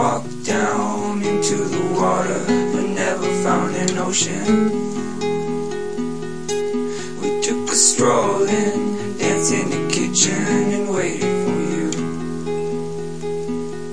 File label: walk down to the water mixdown, folksy guitar and voice; REMIXABLE COLLECTIONS; Folksy Guitar and Voice Stems